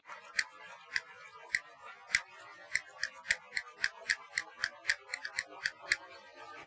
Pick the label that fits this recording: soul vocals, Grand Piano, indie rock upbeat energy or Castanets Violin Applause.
Castanets Violin Applause